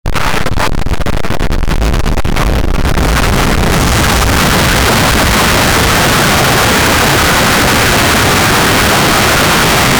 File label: crushing sound 1 second